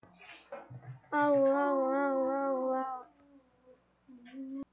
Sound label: owl